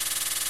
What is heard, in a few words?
standard shot loop; tf; danmaku fortress; reimu